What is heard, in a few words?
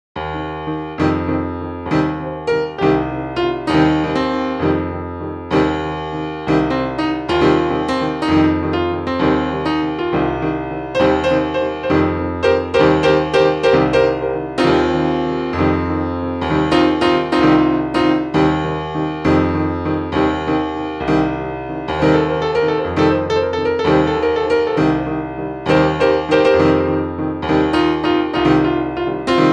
piano